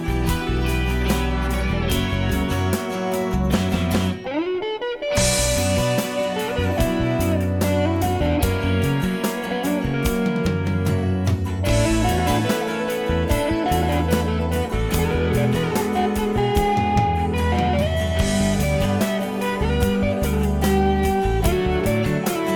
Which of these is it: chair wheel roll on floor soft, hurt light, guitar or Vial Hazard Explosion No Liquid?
guitar